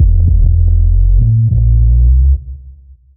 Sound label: bass noisy club, loops; Phonky